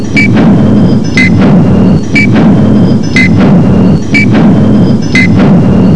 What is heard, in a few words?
clocktick